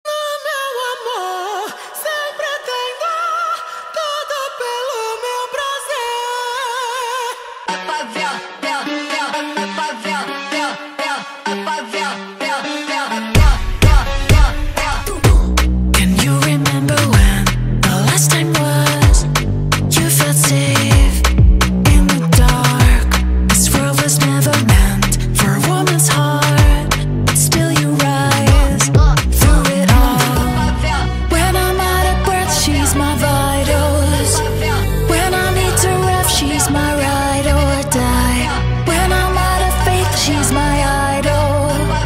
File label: remix